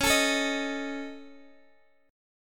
C sharp Suspended 2nd Sharp 5th C sharp Guitar DADGAD